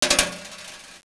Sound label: 3 coins